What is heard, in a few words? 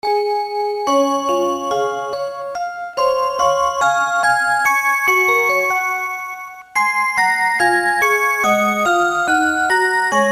rhythm song, clocks